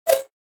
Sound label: Futuristic transition